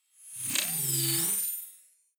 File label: swell short metal string computer; sweeps-swells